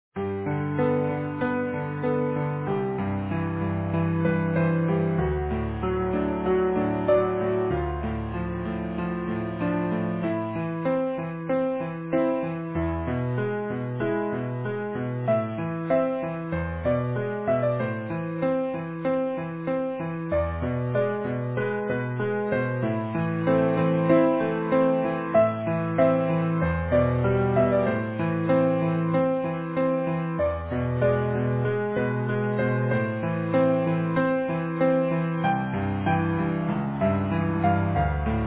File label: Easy Piano